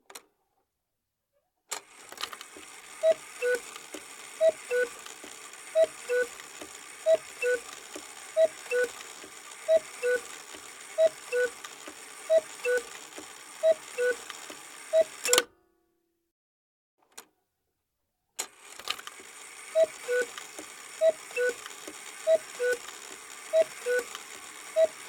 Cuckoo Clock Toll; Colin Lechner; Colinized Cuckoo Clock Library

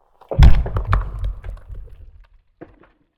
Ice, Block, Crack, Distant, Medium, Glacier Ice; Mattia Cellotto

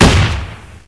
hmg, weapons; hl2

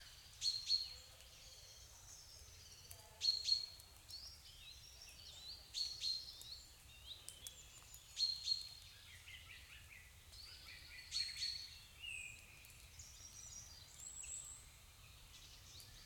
Forest; InMotionAudio; The Forest

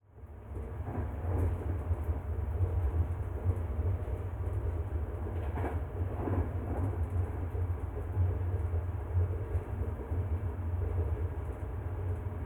indoor train trip passenger coach; Giorgio Riolo; SILENTSCAPES

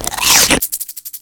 poison bite, headcrab poison; hl2

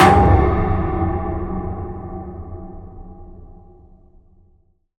heavy metal impact large tank; BlueZone; Heavy Metal Impact Sound Effects

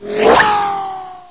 near miss, weapons; hl2

